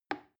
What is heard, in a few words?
plastic button little release soft